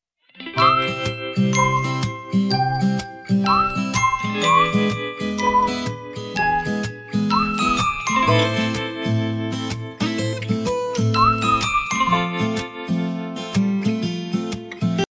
korean notification

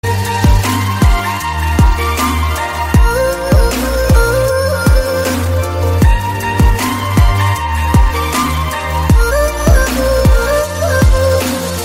Instrumental

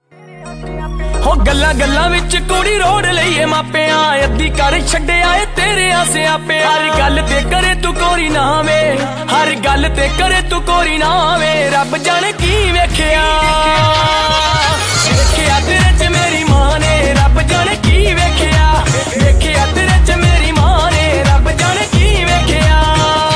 Punjabi

